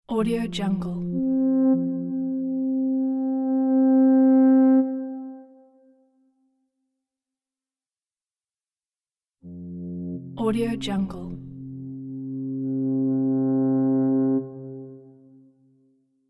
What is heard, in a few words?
viking warhorn